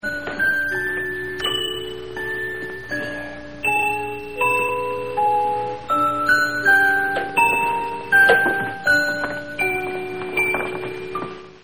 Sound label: music; Tabitha